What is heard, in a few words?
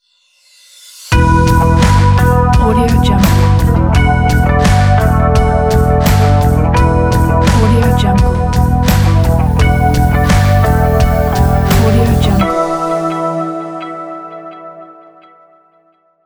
rock logo